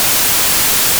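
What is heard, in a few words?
noise 1bit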